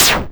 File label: weapon single shot